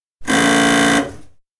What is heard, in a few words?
Routemaster Double-Decker Bus, interior, buzzer rung once; Bus Sound Effects; BBC